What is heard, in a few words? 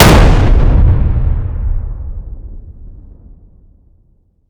explosion close int; gmod; arccw uc commons attachments